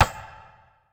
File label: Competition Clash; This Is the Police 2